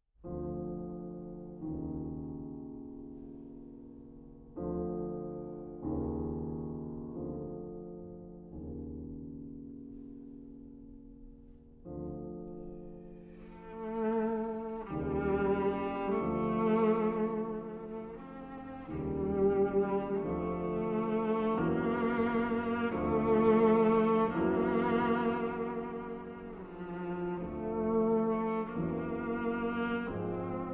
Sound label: Cello Sonata in C Major, Elegia. Lento, Live; Op. 65; Ed. Rostropovich; Schloss Elmau, Krün